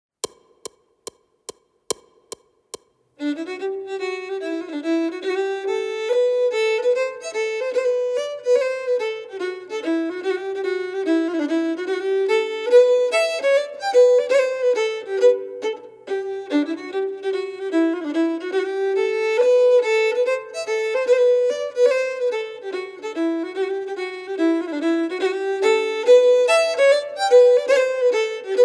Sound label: Full 144bpm